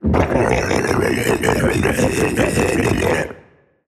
stain attack vox

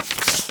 book page turn

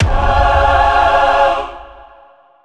angel transform end; dogma

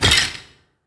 recall finish

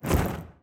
flap; fallen

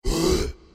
stain burst; thestain

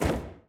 chase flap; fallen

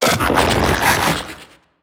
death; hollow

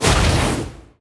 poop smoke; clog

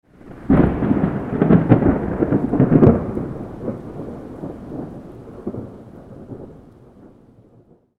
thunder

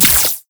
red lightning weak

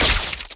red lightning medium

